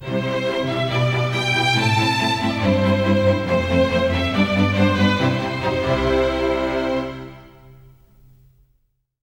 Victory Theme; super smash bros. ultimate expanded vol. 11 mother; EarthBound Victory Theme; Melee

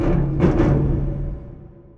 Spook

